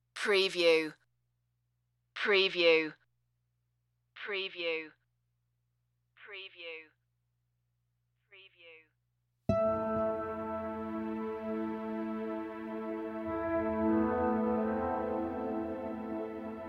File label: turmoil activate no drums no perc no bass